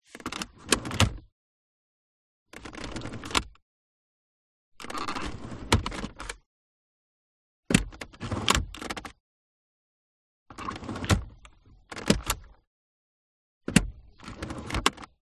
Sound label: AUTOMOBILE S-TYPE Jaguar Other Compartment; 2000